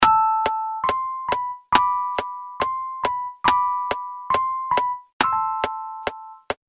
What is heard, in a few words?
Piano; Jazz Funk